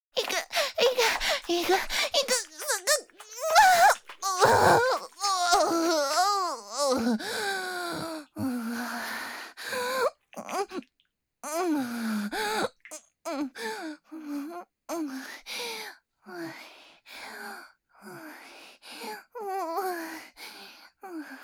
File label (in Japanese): 絶頂（SEあり）高潮